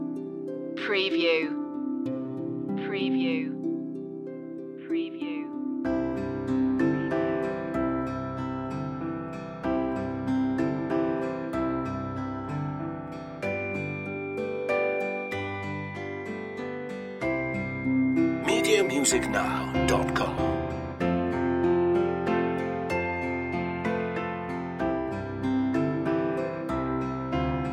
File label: orchestral; silent night; media music now